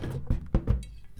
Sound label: Heavy Thonks; BB Retail Therapy Sample Pack